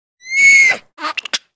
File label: Monkey Chirp